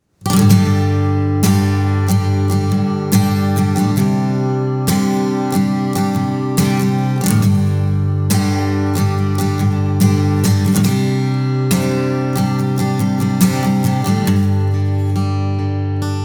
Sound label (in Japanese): ギター音